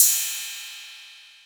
808 CYM; EMU Orbit-3

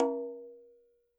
KILLER CONGA

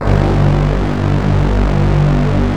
NOISESTRA G1; M1+D50

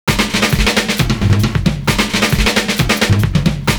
1 more fill; Univers Sons - Kitch 70s

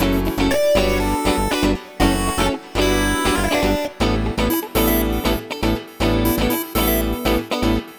Backing, 120bpm D, Nu Disco; Zero-G; Happy People

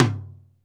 TOM MID; Back In Time Records; Big Bang

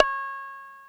PERC WAVE, drums; Big Fish Audio; Synth City

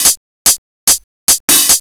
HH LOOP; USB Soundscan vol.27

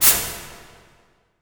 IMP WIPE, Cinematic Impact

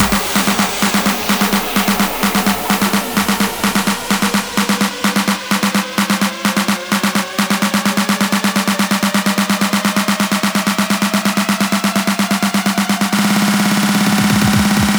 Fills 128BPM, Clubsounds

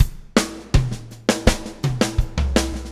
BUGA, BUGABOO

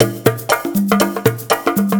120 PERC

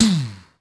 M627 TOM; Crypton - DrumBase5000; MPC DRUMS

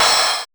GRUNGOID LO; TEK PERC1; Drums In Your FACE!

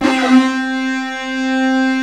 SCREAM LEAD, ENSONIQ VFX; Rubber Chicken Software Sample Bank

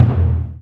LARGE DRUM, JAPANESE PRC

